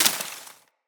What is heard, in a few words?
Bullet Impact Debris Grass; Weapons